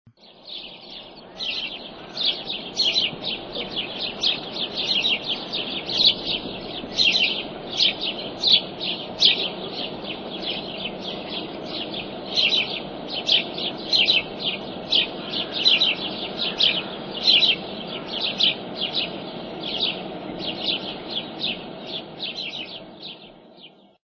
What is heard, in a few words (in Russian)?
vorobji